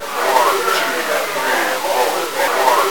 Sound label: CROWD FX; E-Lab; X Static Goldmine 2; akai